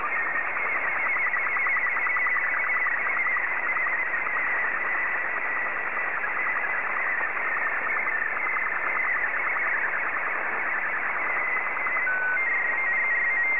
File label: sstv; R12BW